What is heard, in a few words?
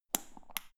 power button turn on off